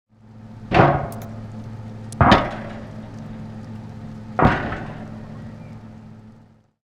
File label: boiler Banging